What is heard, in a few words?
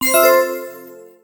Magic Harvest; Levels Hub Swamp 03 Cursor Harvest; Magic Add